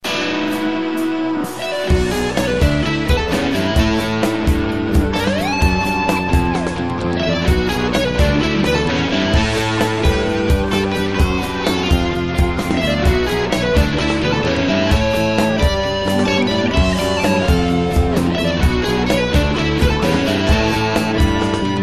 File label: Nokia Tone Rock